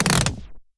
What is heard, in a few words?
quick stop; netent